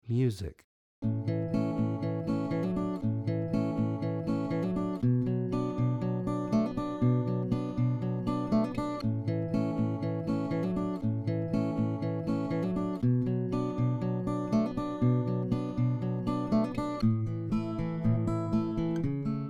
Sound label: jingle